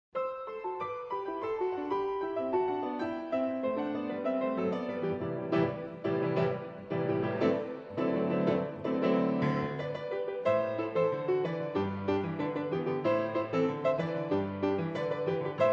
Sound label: Crazy Calypso, Grand Piano